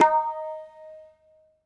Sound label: d sharp hit, tabla; Dirt-Samples